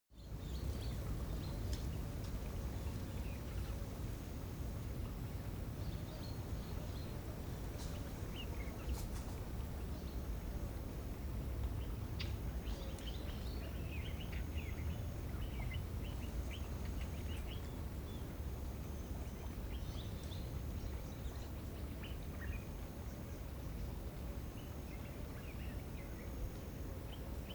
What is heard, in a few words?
african bush midday spring